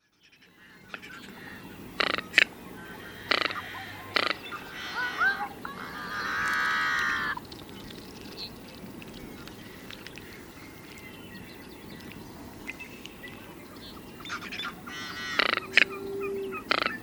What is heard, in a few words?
Cinnamon Teal